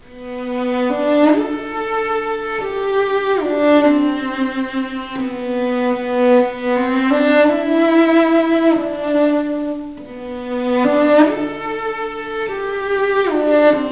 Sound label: cello